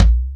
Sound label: Tom TP; Proteus 2000; Digital Sound Factory Proteus 2000